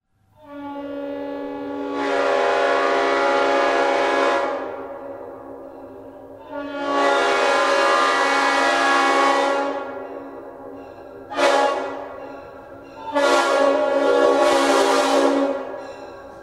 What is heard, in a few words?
horns; RS5T